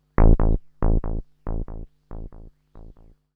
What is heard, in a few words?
synth tekno 140 A; Techno2; Keys